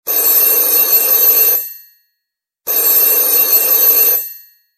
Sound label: french phone ring loud ringtone